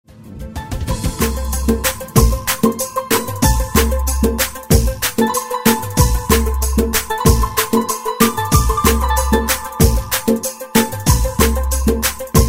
Rai Music

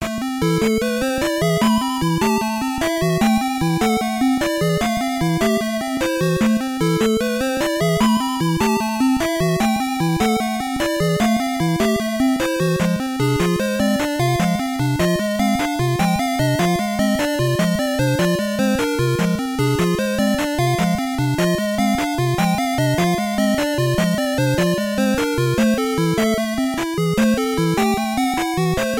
Final Dungeon; LOZ2

